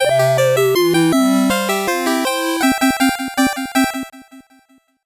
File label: 8bit jingle normal